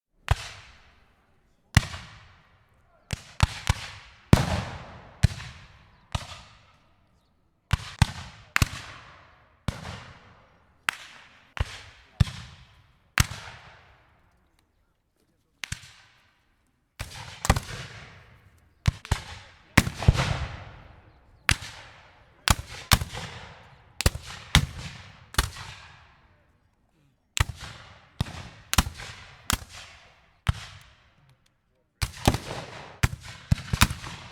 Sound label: rifle musket fire random